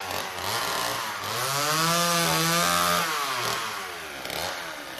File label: Chainsaw Single Rev